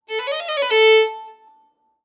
Violin Whole Note Runs

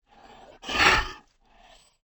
dinosaur rumbling breath; SoundsCrate

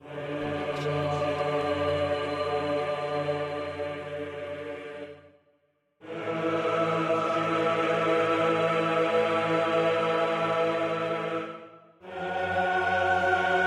epic choir; soundscrate

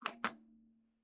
TV Button Press; SoundsCrate

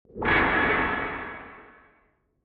retro sword swipe; soundscrate

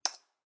Computer Keyboard Single; SoundsCrate

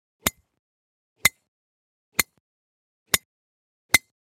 golf club driver stereo; soundscrate

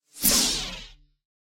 lightsword turning on; soundscrate

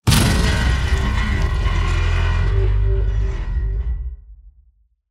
metal beast; soundscrate

